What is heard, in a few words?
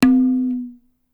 tabla; Dirt-Samples